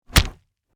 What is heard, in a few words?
Punch Body Hard, Impact; Punches and Hits (Sound Effects:Combat); BlastWave